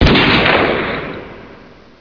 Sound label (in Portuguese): tiro; jb nuke csbr